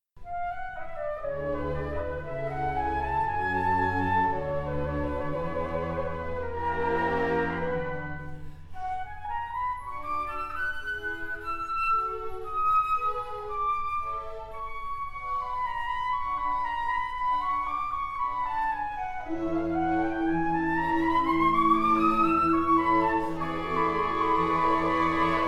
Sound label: Flute Con G